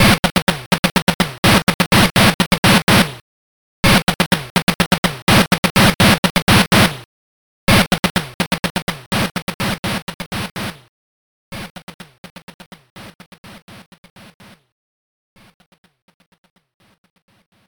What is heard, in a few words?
Drums